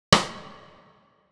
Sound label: sidestick